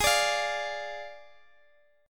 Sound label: F Minor 6th G sharp